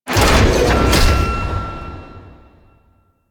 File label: Elevator Stop; Warframe; Venus Open World; Venus Solaris Elevator